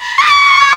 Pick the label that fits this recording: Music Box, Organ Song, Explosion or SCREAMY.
SCREAMY